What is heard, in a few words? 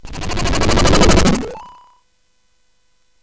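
sfx, MOS 8580; hvsc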